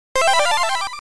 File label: Start Tone; Jack Bros; Virtual Boy